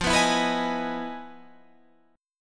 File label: E Major 7th F; Emaj7/F; strummed; JGuitar